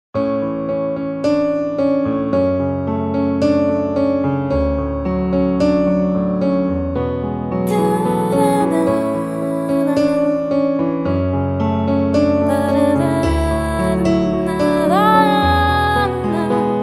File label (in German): Kleiner Stern Piano